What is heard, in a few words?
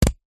stapler pocket sized single staple card; office